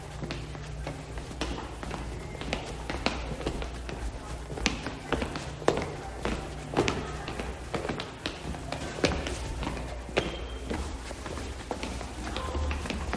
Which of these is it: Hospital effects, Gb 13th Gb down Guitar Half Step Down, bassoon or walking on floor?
walking on floor